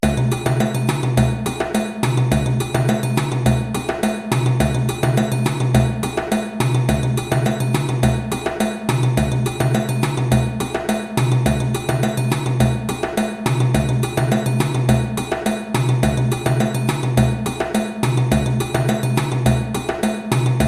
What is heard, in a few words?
Danza Groove 210 bpm, djembe